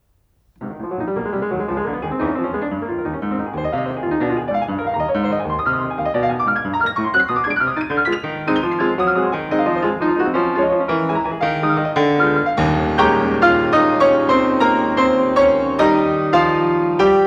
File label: Carnaval for piano; Schumann; Op9; Rubinstein